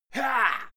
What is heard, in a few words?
male fight attack; schreie; menschen; soundpool